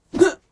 Melee Attack With Voice

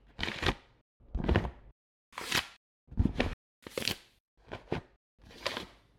Cracklings

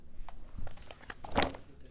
Dropping Notebook

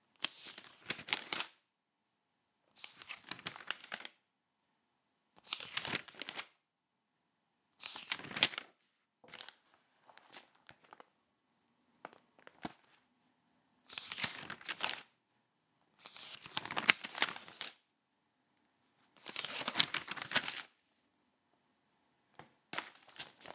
Magazine Pages Turn Rip; Rkeaton

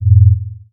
Enter Button Low Robotic

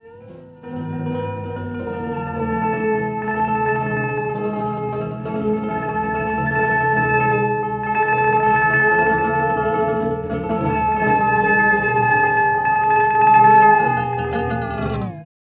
Hammered Tremelo

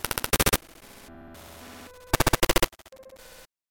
Glitch buffered statiq; Suonho

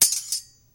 Sword clash

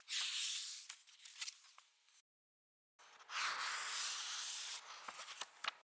Page Turn In Thick Book

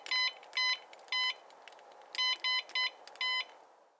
Dial Code

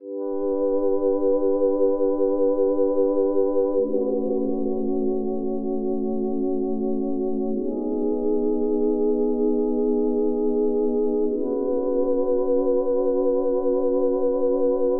Light Pad

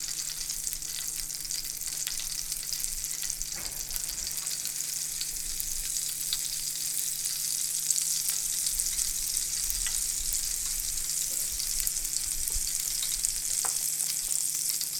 Sizzling

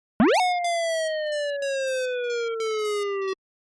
Square Laser

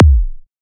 Muay Kick

